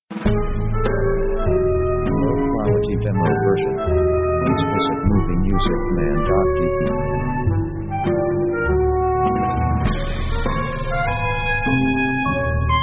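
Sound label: orchestra weird comedy march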